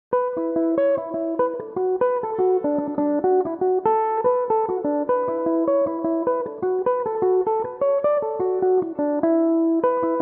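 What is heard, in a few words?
E dorian, irish mandolin, tunes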